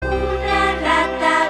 una rata sonido; munequitas elizabeth

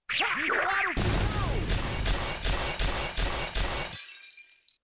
disparo

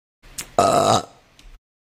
eructo aaaaah

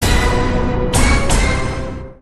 impacto

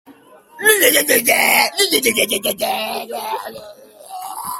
bombo

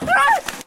grito

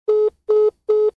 llamada cortada

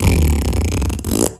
flatulencia

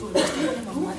tos

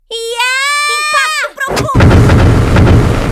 arme impacto profundo

